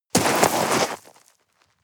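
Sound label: step gravel debris hard, footsteps; schritte; menschen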